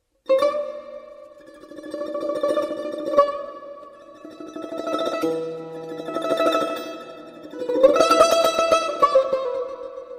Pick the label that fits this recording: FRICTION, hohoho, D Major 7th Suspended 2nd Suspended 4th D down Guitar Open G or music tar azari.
music tar azari